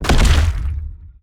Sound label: Stealth Attack Land; Evolve; Behemoth